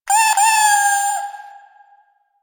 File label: Cat Loot Crate Meow; CatLootCrateCommon